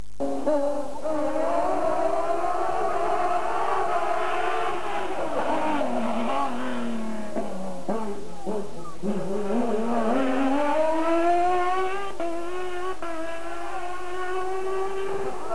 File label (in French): barquette bmw, Barquette a moteur BMW; vous aimer le bruit des F1 ?